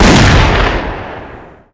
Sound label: bad gun; outlaws